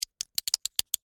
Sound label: Legos Snap Together, Foley, Legos, Snap, Together, Fast, Build, Plastic, Toys; BlastWave